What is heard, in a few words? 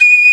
VIB FLUTE B4; AKAI S-Series CD-ROM Sound Library VOL-2